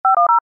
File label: RDS TA Flag Close; dtmf rds ta flag switch